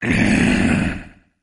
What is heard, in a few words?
zombie sound